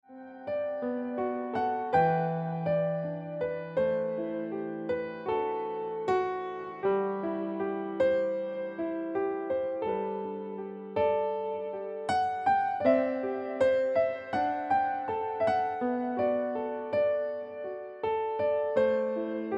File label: Ringtone